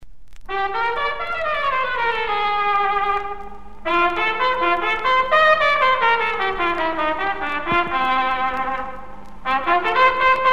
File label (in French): solo trompette desordre; COURT METRAGE DESORDRE; Jacques BARATIER